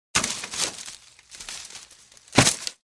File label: Wicker Trash Basket Impact; Hollywood Edge Foley Sound Library